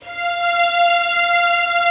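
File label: Vio legato F4; Digital Sound Factory Virtuoso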